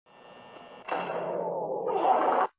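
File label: jumpscare alert; meme foxy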